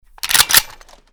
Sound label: ak47 reload empty chamber; Tacz1.1.5+; RSWS 1903